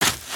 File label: Footstep Sand; creatures pets